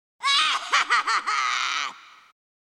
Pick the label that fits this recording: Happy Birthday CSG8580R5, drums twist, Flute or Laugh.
Laugh